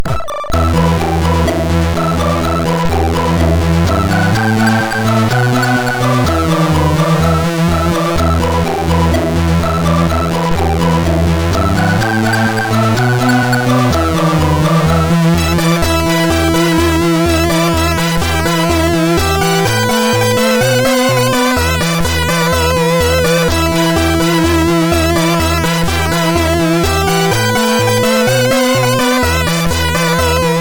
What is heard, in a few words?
Old Music, MOS6581R2